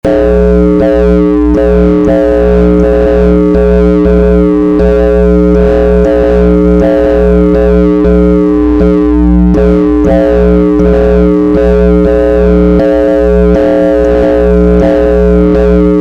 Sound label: synth foldspace